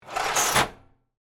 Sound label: Cash Register Close, Industry, Cash, Register, Close, Drawer; Cash Registers; Blast Wave